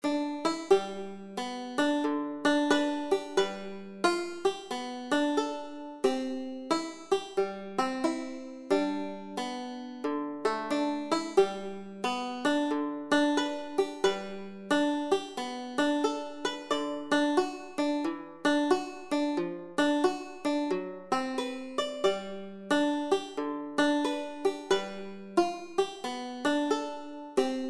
banjo

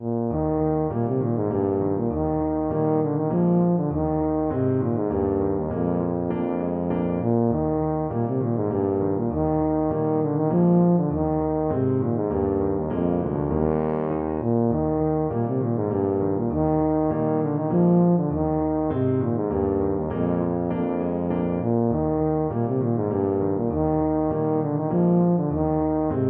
galatan, tuba

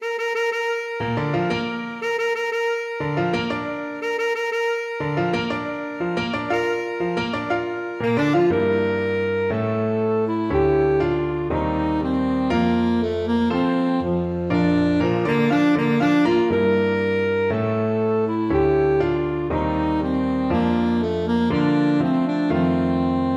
saxophone; mendelssohn; wedding march

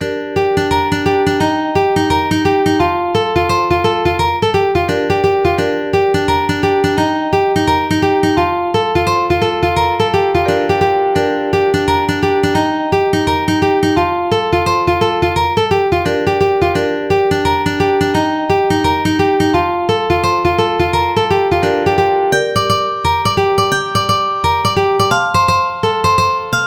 Tam Lin, ukulele

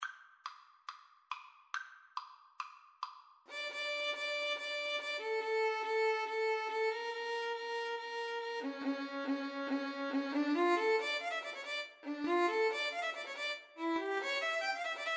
violin cello, Violin-Cello Duet; mozart sym25

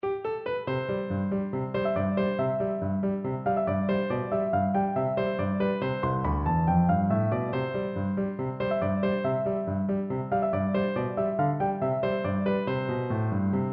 bulgar piano; der shtiler

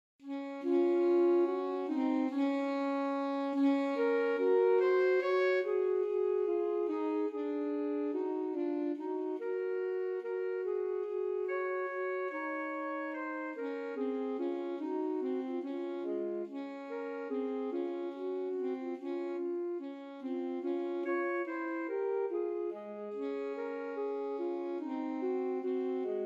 rest in the lord alto saxophone duet

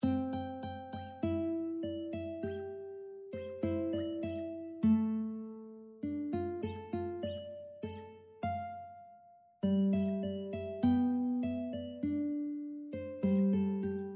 chinese love song guitar

